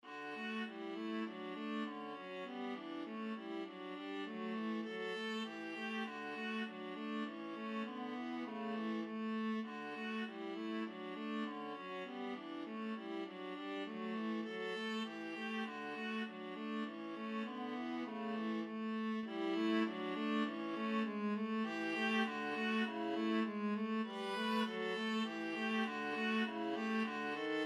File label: melody, viola duet